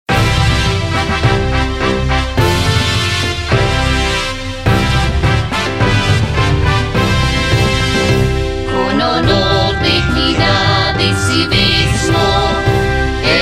himno escuela vocal; san jose de la providencia; quito ecuador

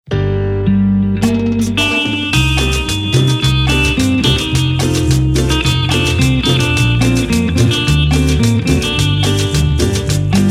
cumbia pista, pistas musica bailable

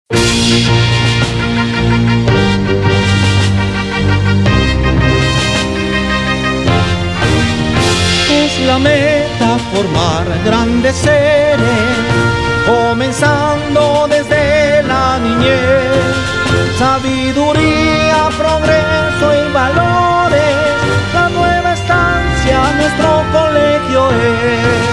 himno vocal; la nueva estancia; bogota colombia

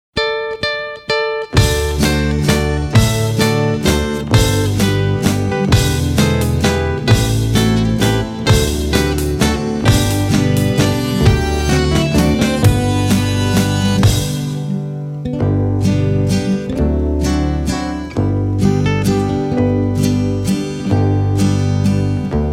pista, pistas musica latinoamericana

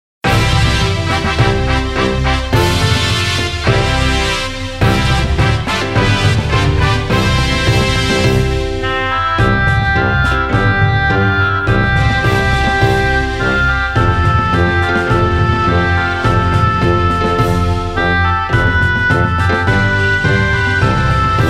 himno escuela instrumental; san jose de la providencia; quito ecuador

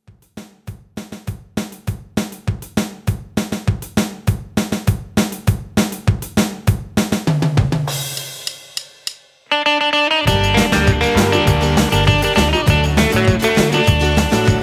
Pista, rumba flamenca

